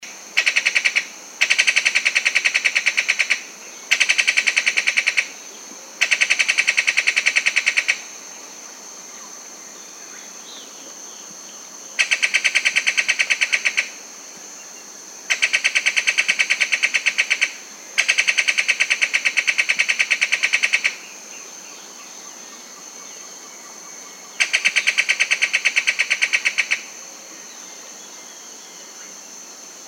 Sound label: ringed kingfisher